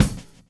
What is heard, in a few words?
groove; AMG Gota Yashiki Groove Activator